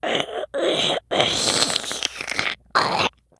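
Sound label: ambient zombie; cstrike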